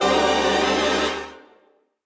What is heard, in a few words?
EXPLOSION